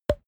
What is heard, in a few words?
pop simple; koerpergeraeusche; comedy-cartoon; soundpool